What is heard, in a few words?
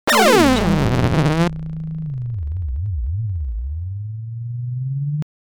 retro doom lose